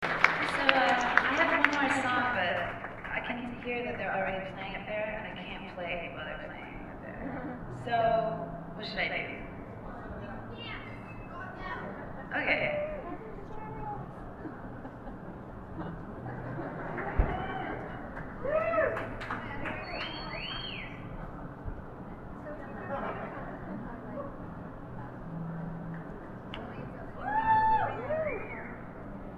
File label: dance solo